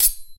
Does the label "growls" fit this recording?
No